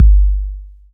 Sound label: big room kick single hit g key; kick drum samples